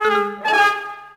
Pickup

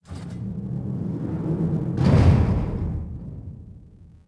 bay door muted; Star Wars Obi Wan; XBOX

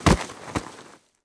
bodyfall grass, foley

